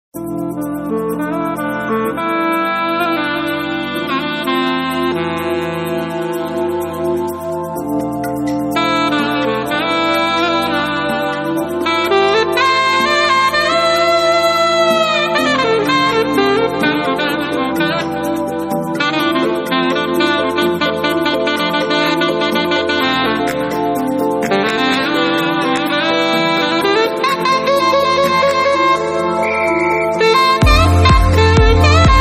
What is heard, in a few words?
JAZZ